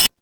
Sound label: office card reader